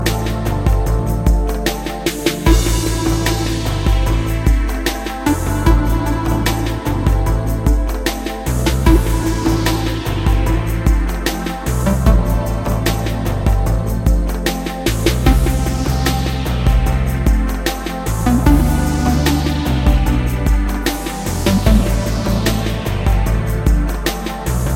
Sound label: cyborg chillout lounge